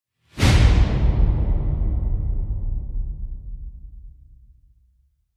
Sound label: Death Whoosh; Gothic Storm Music